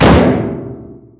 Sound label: metal barrel impact hard; physics